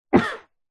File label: human male man cough single into cloth or arm muffled; zapsplat